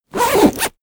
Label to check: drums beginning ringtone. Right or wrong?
Wrong